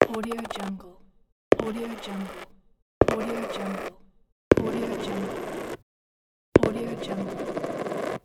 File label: single coins thrown